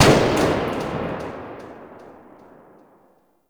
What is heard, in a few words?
Grineer Emplacement Tail; Weapons